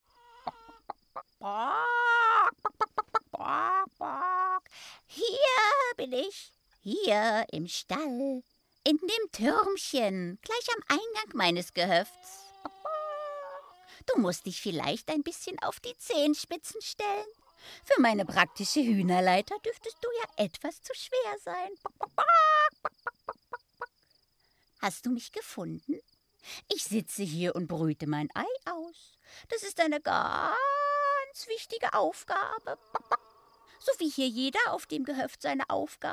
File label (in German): Huhn